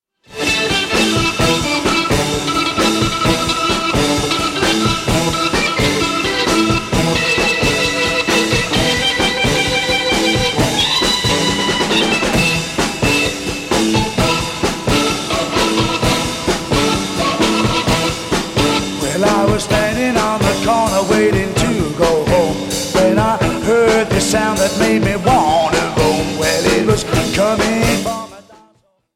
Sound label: harmonica